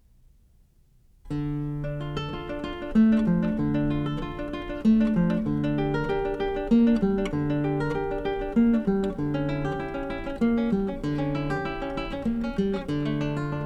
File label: Prelude in D Minor; Bach